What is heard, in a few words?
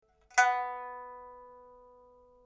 pipa; chuYinKouXian